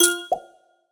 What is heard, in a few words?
mines success